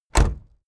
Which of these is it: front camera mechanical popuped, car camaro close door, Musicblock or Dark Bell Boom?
car camaro close door